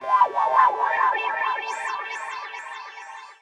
KEY SHOT TEKNO 140 A; Techno2; Singleshots

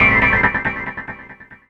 KEY SHOT TEKNO 140 A; Techno2; Singleshots

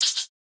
Hat

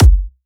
kick, drum hits; Electro; Anthemic

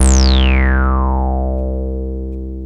TB BASS; TB-303

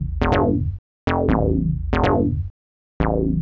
SNTH BASS ELCTR 140 A, Techno

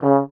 C#2, French Horn Stacato; DirectWave; Varazdin Orchestral

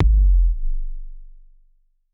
C1 Tuned Kick; Touch Loops; ElementsOne; Abstract Rhythms

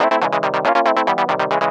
TECHNO SYNTH 140; Keys

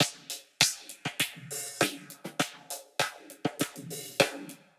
100 Break Down Stomp, Warped Live Drums; Loops; Touch Loops; CosmicFunk

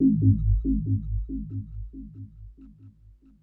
synth tekno 140 A; Techno2; Keys

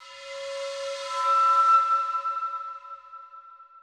bottle, ethno, 125

